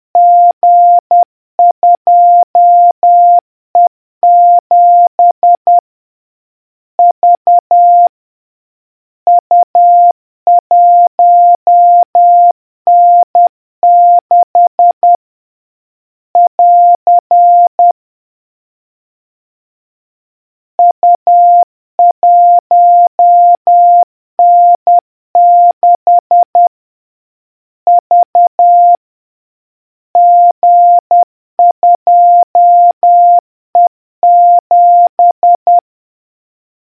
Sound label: morse; m209